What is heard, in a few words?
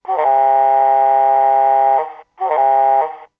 horn